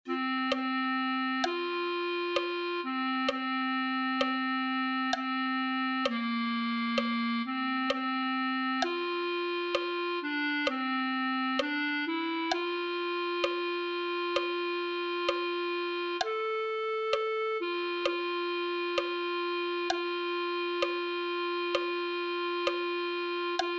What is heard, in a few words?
clarinet